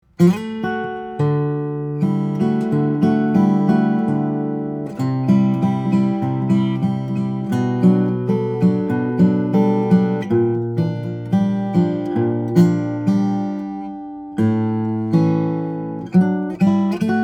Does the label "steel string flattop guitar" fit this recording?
Yes